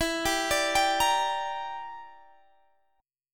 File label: E Minor 7th Flat 5th E, Guitar Standard; Em7b5 arpeggiated